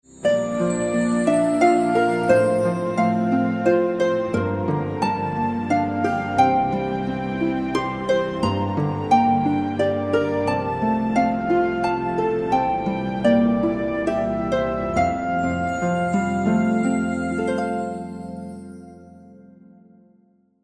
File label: sweet